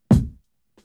KICK

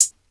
HiHats; Closed Hats

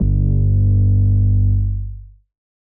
808s

